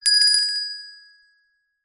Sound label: bell small hand ring short